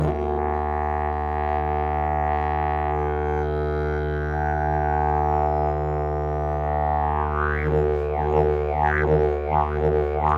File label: didgeridoo; javor zimni